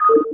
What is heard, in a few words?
Menu Command; BroodWar